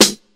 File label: 00s rap acoustic snare sound g sharp key; snare drum samples